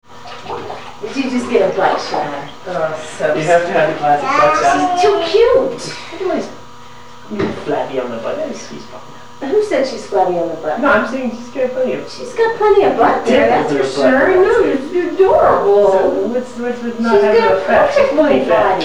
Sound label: sweetie splashes, baby